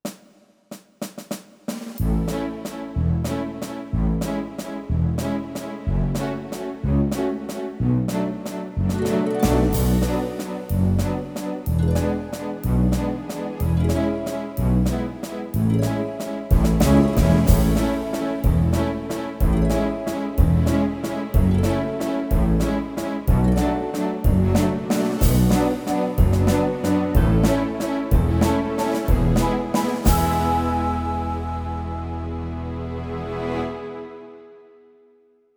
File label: style ballroom viennise waltz, yamaha tyros3